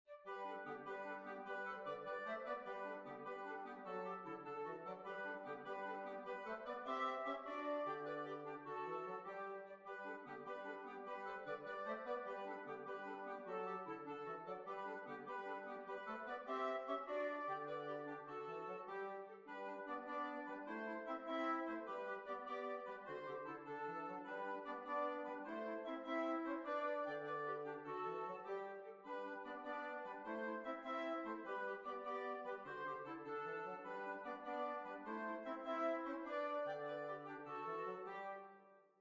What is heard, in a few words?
Jig; Oscar Woods Jig